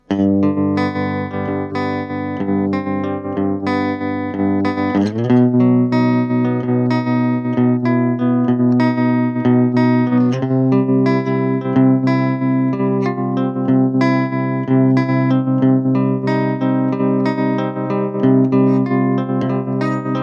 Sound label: pulsar creep; psst